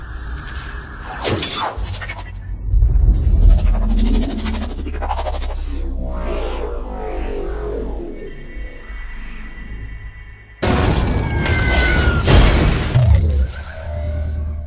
Transformation

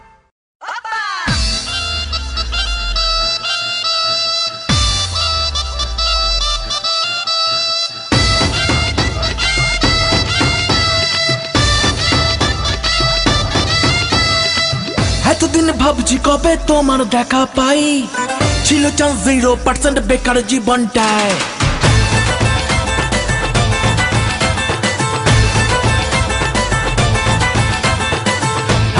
Bangla Love Tone

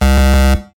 Wrong Card; SMB3

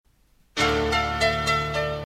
Msg Tone

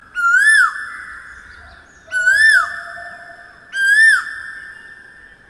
Birds Cukoo

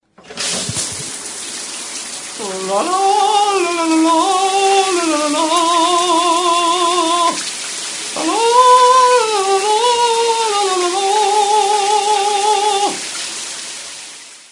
Bath Singing

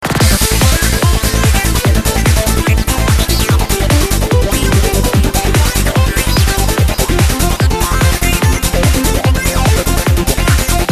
Only Music Transbass

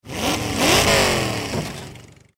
Engine ghurrr

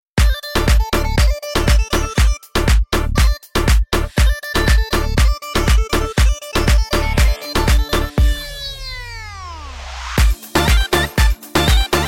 Bulgarian Beat

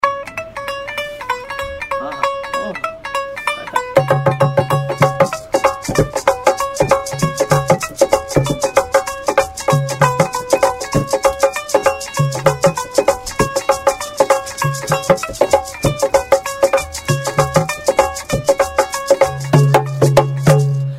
Dholki

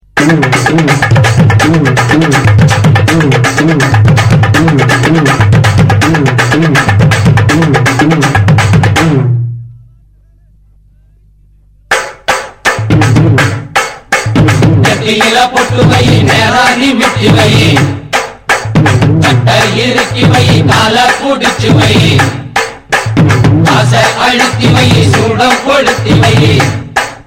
Tamil Heavy Beat

